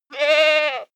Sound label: goat baa male